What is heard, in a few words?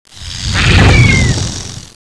pit worm attack eye blast